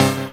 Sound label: Synth Shots; Vengeance Samples